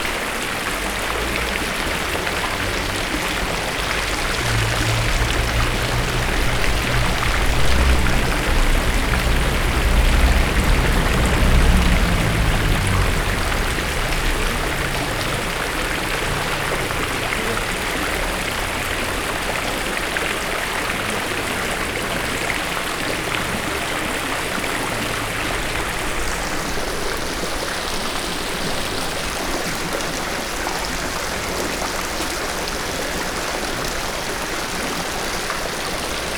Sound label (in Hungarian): tizenkét udvar, nagymedence